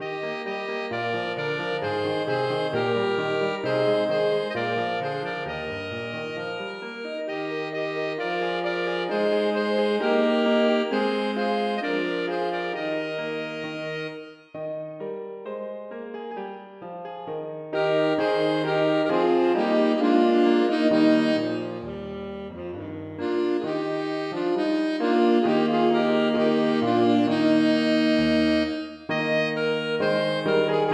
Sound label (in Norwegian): Dantz for to altsaksofoner, tenorsaksofon og piano